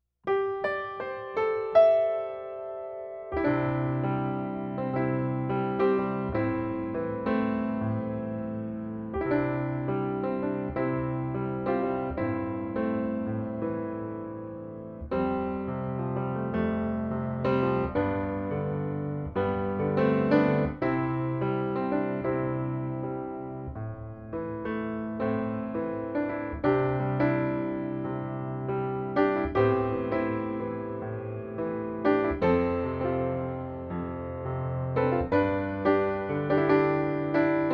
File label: Piano Ribbon